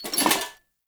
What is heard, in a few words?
Knight Melee Swing Foley; Enemy; Mendicant; Mnd Knight